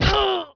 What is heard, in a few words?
hop die; Zeus